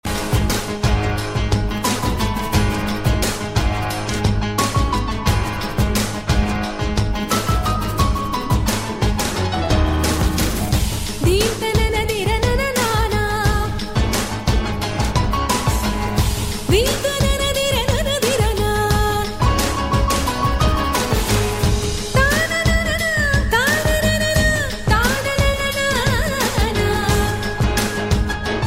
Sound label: morning raga; thaye yashoda